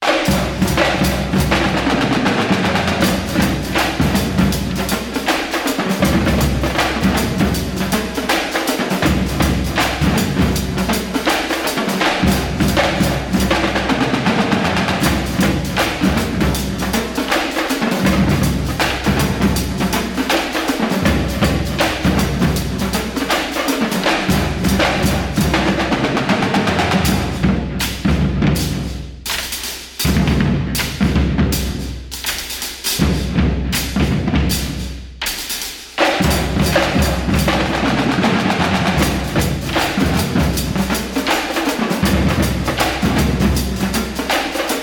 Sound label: Broadcast Fight Song; NCAA Basketball 09; PS2, PS3, Xbox 360